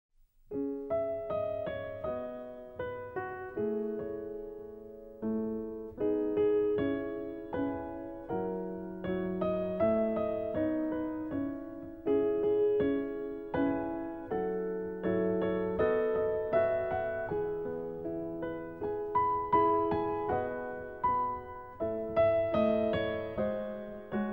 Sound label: Piano Solo